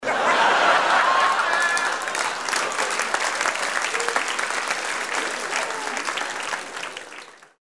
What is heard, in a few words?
laugh long